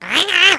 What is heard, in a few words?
pre attack; The Monster Plane; kolmods preatt